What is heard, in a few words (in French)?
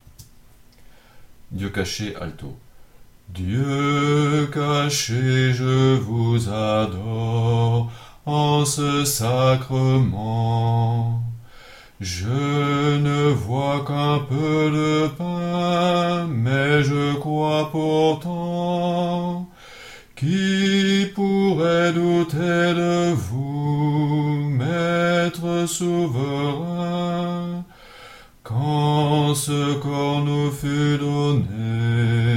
Dieu cache alto